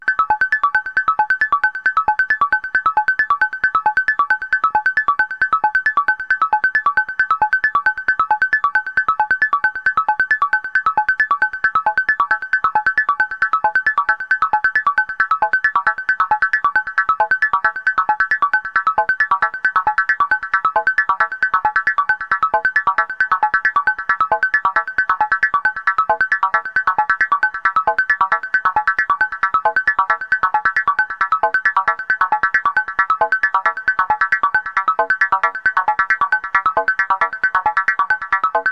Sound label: vca dynamic fm; Thomas Henry